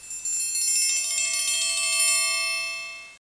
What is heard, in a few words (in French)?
mettre goutte; French Version